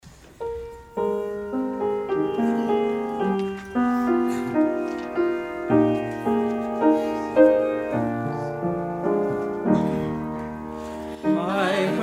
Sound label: I Heard the Bells on Christmas Day, Choir; CHRISTMAS EVE